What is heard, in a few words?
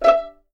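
E5 pizzicato F, violins; East West; The Ultimate String Collection; VLNS PIZZ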